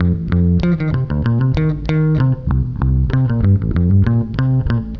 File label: BASS, BASS GTR